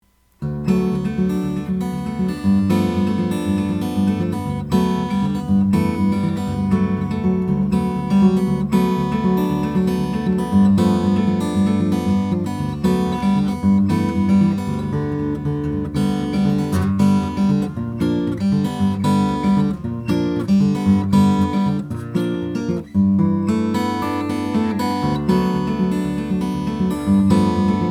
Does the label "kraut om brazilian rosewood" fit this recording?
Yes